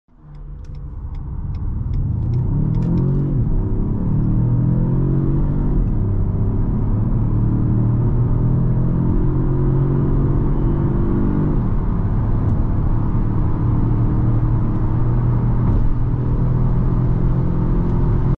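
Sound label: Talisman, car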